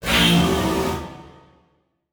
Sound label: Title Transition Feedback Blast; Adobe; Production Element